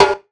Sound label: TIMB, Ragga & Dub Reggae; USB Soundscan vol.27; AKAI